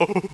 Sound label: ouch; Burnin Monkey Solitaire